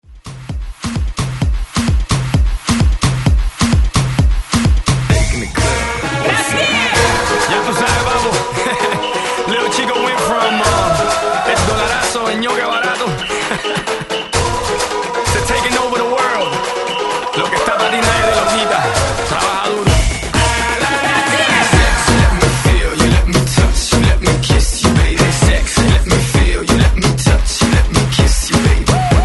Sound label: Mashup 130Bpm